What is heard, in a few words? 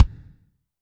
kick, Zoom MRT-3B